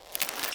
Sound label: tentacles in; DoomRTX